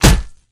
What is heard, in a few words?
melee swing hit world; Left 4 Dead 2